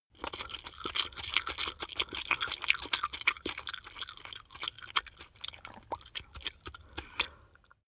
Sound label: chew spaghetti smack fast